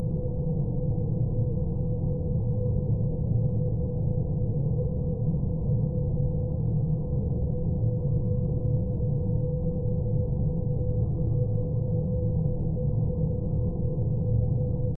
room tone

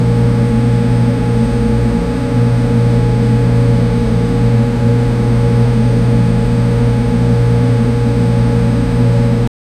underground control room, air circulation

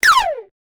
shooting sound

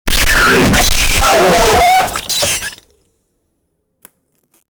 a star being ripped apart; universe

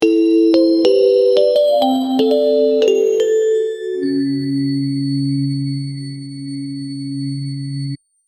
question revealed message, trivia

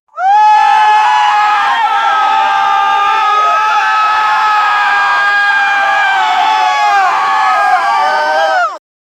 screams and wailing, funeral

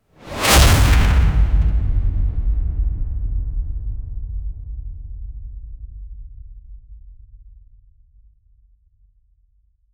a deep whoosh followed by, glass

